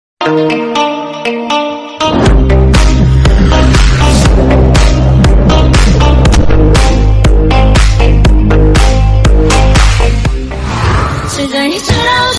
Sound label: fast music cell phone tone; iphone ringtones